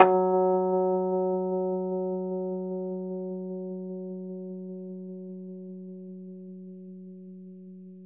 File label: Rhodes F#; 3D Sound; 3D Keys VOL-1